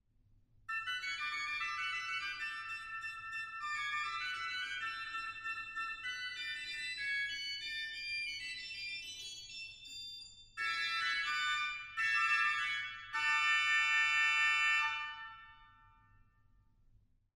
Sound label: Scharff, organ; stade